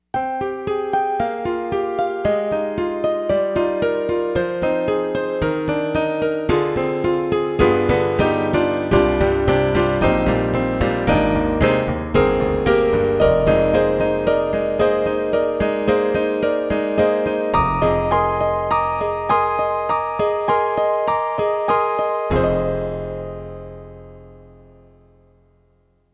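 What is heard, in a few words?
YAMAHA TG55 Hammer Piano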